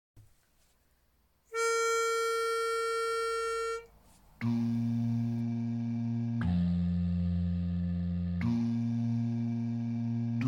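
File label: Bass with pitch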